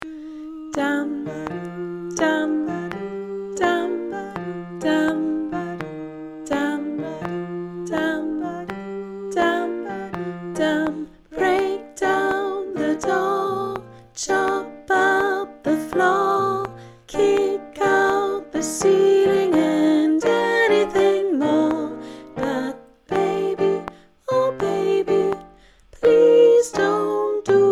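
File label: Soprano please don't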